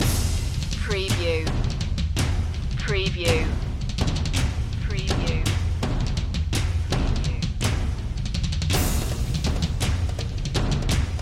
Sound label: percussion loop